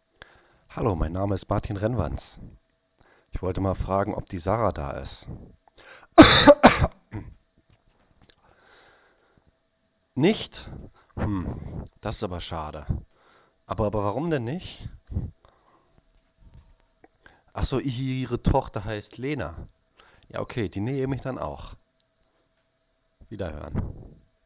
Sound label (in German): husten